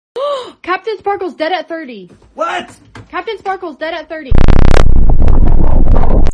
breaking sound barrier

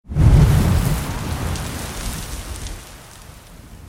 dragon take off; npc

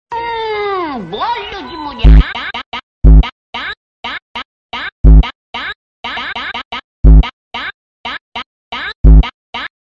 funk

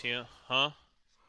hehaw

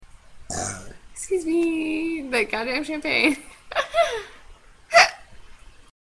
burp

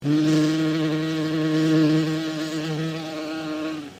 bee buzz

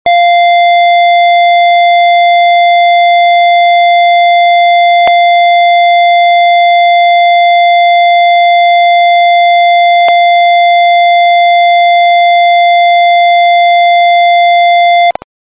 long beep

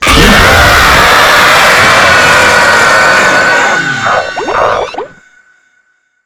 faster jumpscare

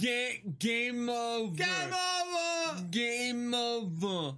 game over

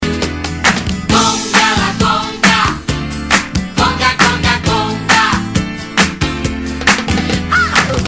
conga conga conga

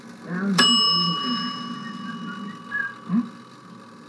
old schwinn bell

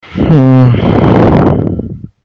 loud breathing

